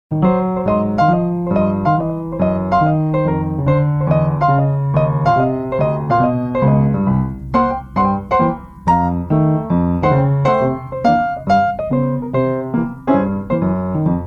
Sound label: PIANO SWINGER